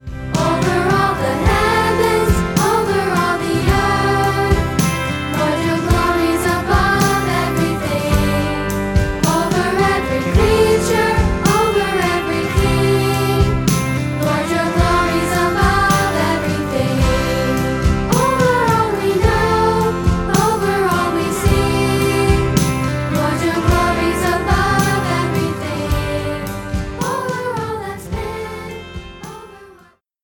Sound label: Instruments